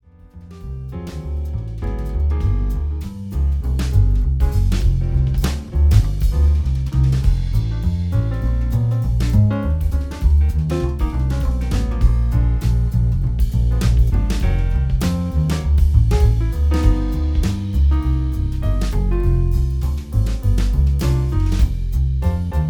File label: jazz trio